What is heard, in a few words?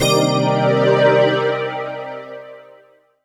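jazz chord, pads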